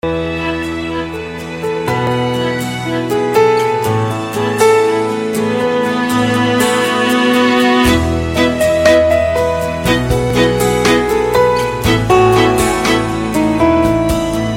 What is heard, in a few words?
sweet alarm